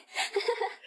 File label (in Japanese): 笑い